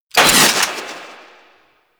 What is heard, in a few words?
Flak Cannon Movement; Grineer; Weapons